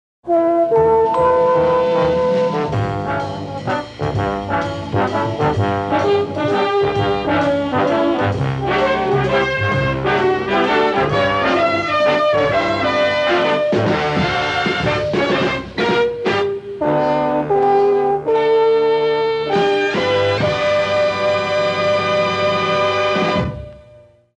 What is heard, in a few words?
Swing Waltz; KEX